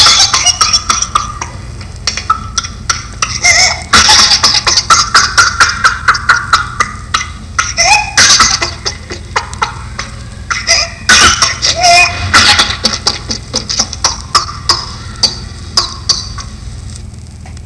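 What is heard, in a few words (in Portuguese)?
bordetela, sons e choros pediátricos; SÍNDROME COQUELUCHOIDE; SONS E CHOROS PEDIÁTRICOS CARACTERÍSTICOS; PEDIATRIA UFF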